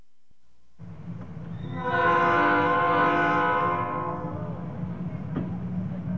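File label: TRAIN LV, train leave; Gold Medal Software Volume 2; music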